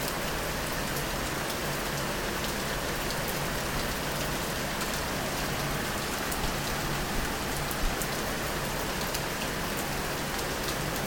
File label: rain building